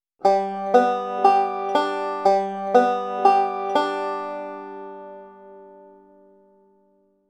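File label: alternating thumb roll, banjo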